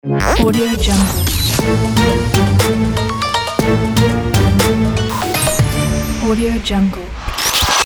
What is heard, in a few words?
eastern radio jingle ident